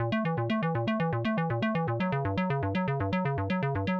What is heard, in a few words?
SH101 Arp (120BPM), retro house